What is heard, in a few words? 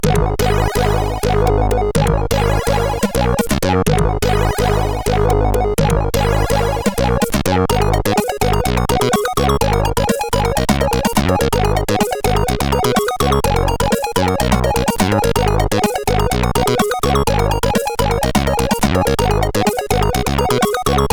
Note Music, CSG8580R5; Note Music 1